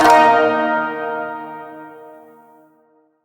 Shawzin Chromatic Chord G; Chords; Warframe

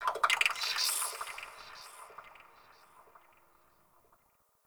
Woodpecker; Warframe; Tau

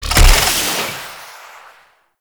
Mech Jump Slam Start; Vehicles; Abilities; Warframe; Nechro Mech

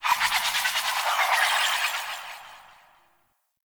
Ship Data; Ambience; Corpus Ship; Warframe; Corpus Ship Remaster

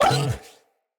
Kubrow Feral Vocal Pain

